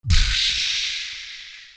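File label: percussion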